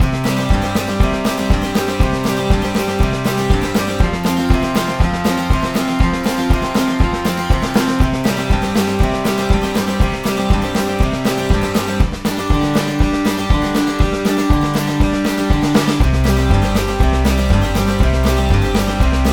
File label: (Instrumental)